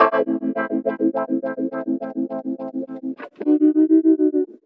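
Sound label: guitar, Roots Funk; USB Soundscan vol.41; AKAI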